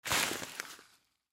Rock Salt Bag Pick Up; Materials; Foley; Blastwave

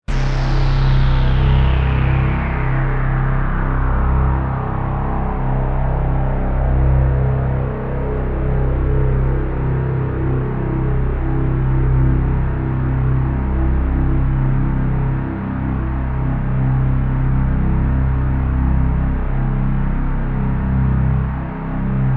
Drone Synth Stab, Long

